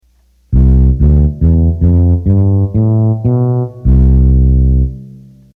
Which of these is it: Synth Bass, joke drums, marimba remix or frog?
Synth Bass